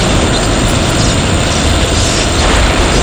fire, weapons; focus rifle

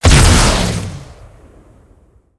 fire large; ppistol

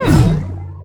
tonal, railgun; weapons; gtav

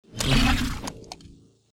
melee

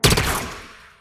shot

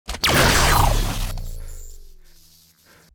hit, sword; bane

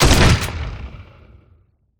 fire, weapons

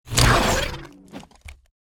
swing, sword; bane

